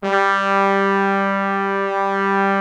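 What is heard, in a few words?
BRASS G2 right, ORCH BRASS; Greytsounds VOL-1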